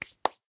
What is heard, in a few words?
hands; taunt; player; yeti standee; sniper; source engine; tf